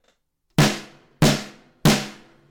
Snare

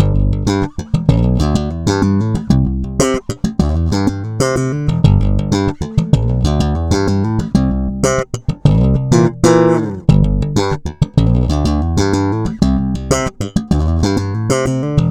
slap

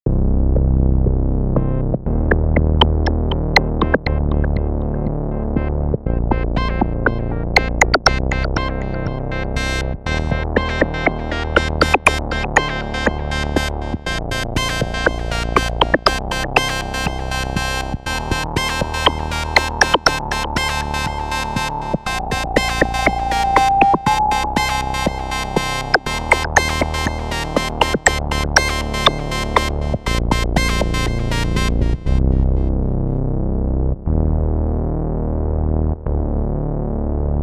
mix 3 sounds

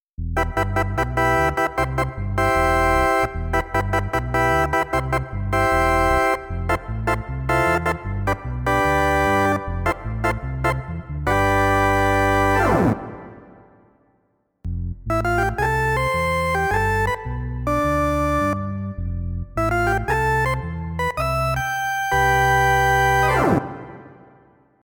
Nord C2D Farfisa

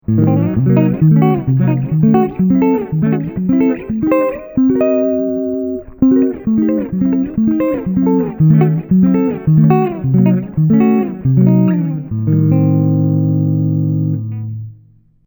Slide

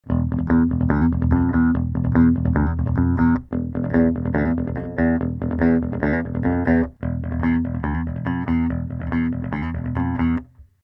pick mid bright boost bass cut horn; ashdown

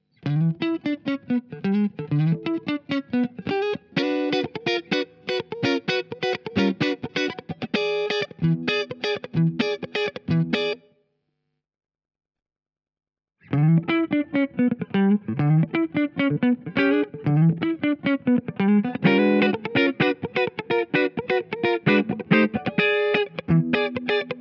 Neck Funk; DAngelico

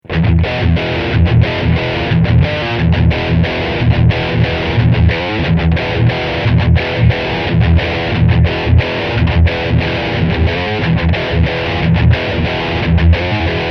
Heavy Riff

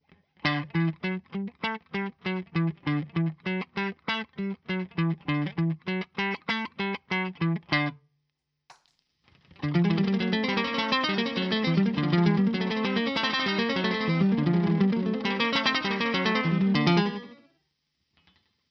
Single note X Pitchshift

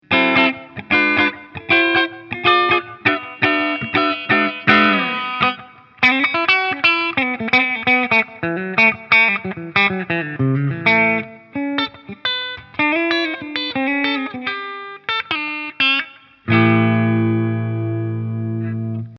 Ibanez RG421HPAH Mitte clean HB

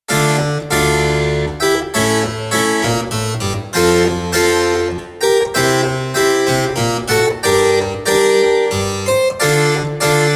Nord Grand Sarabande Cembalo; Haendel

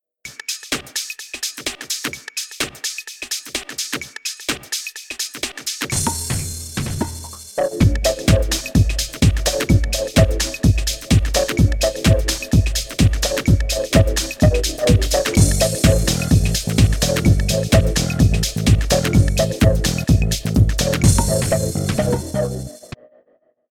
house

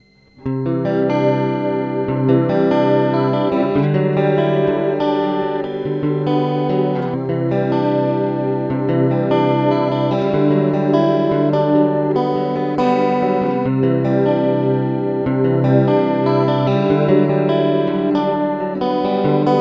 Chorus

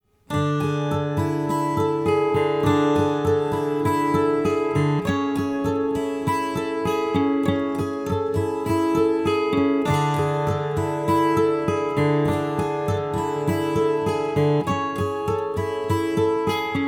12String smartreverb

